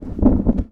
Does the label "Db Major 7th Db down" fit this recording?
No